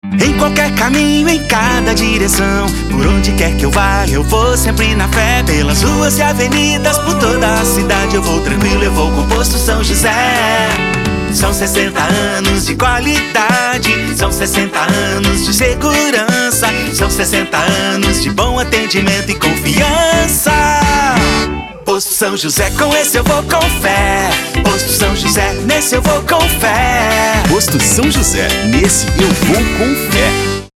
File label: JINGLE